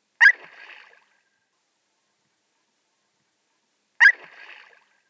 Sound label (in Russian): otvlekayuschie demonstracii - nyryanie, gavia adamsii; animals; vertebrata; aves